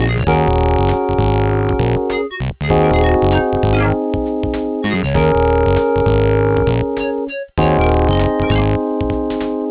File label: adlib, pc